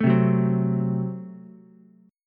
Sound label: Gb Diminished, Ukulele, Gbdim chord; strummed; JGuitar